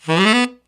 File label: RIFF UP, TENOR SAX